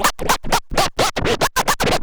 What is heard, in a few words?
SCRAT; Best Service; Advanced Mega Effects